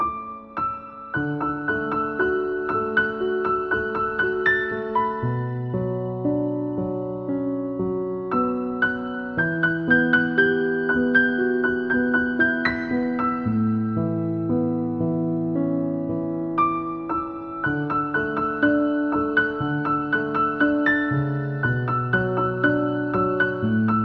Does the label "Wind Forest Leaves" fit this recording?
No